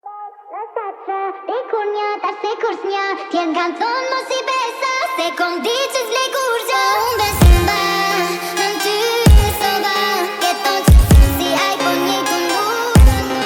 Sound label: brazilian funk phonk remix